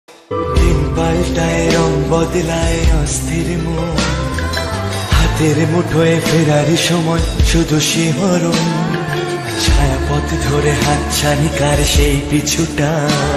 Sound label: Bengali Romantic ringtone